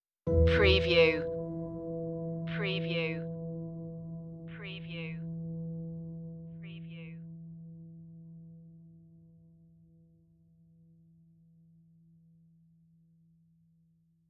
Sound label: darkest hour stinger